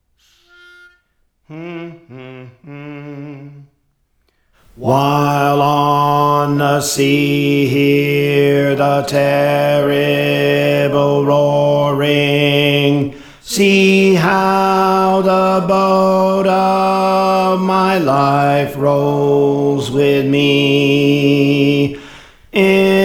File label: Bass; Praise For The Lord Training